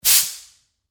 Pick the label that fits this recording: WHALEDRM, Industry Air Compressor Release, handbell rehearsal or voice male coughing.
Industry Air Compressor Release